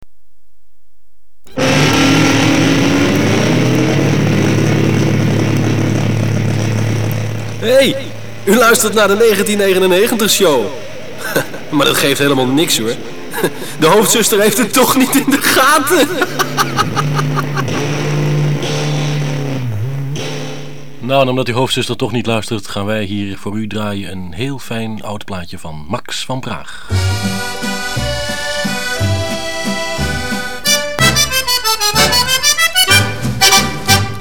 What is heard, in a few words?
jingle; 1999 show